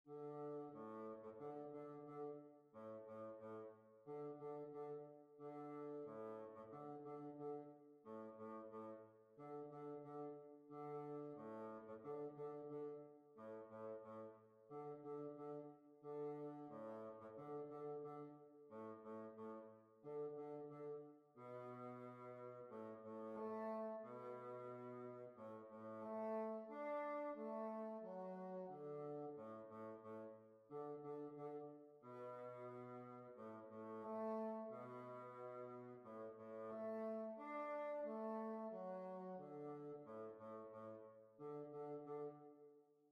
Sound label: Lancashire Hornpipe